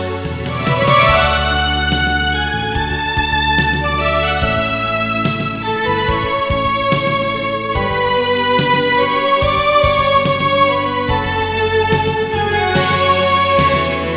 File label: Sad Melody